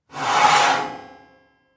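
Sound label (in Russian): звуки царапин на металле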